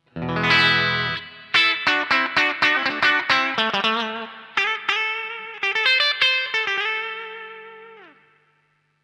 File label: TEL HI